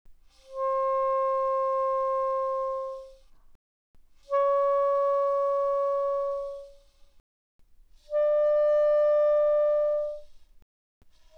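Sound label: Soprano Sax No Vibrato pp C5 B5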